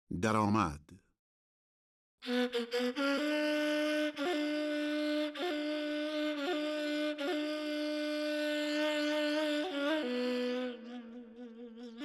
Daramad Homayun Radif-e Ney; Dastgah Homayun

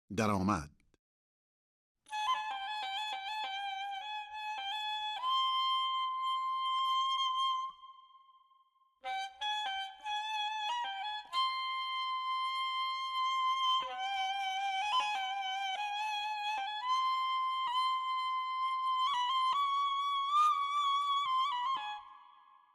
Daramad Chahargah Radif-e Ney; Dastgah Chahargah

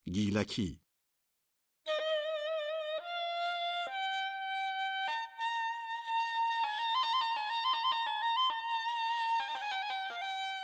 Gilaki Dashti Radif-e Ney; Avaz-e Dashti

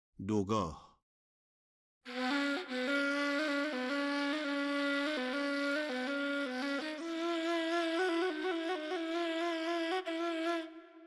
Dogaah Bayat-e Tork Radif-e Ney; Avaz-e Bayat-e Tork

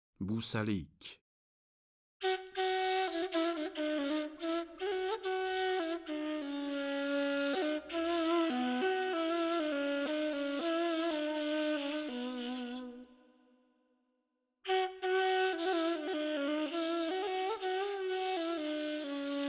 Busalik Nava, Ney; Radif-e Ney; Dastgah Nava

